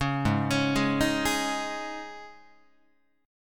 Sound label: Ab Suspended 4th down Guitar; Absus4 arpeggiated; JGuitar